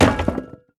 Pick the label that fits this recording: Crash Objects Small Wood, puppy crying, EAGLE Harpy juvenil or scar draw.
Crash Objects Small Wood